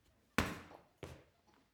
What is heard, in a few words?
punching bag kick medium